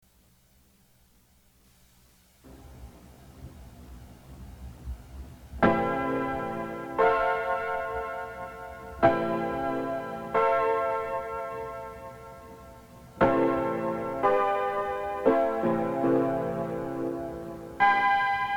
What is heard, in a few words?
short slow 7-4 chorus